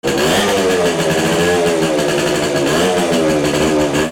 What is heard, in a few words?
VEHICLES